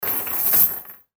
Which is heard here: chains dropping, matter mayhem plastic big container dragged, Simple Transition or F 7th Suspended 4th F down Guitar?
chains dropping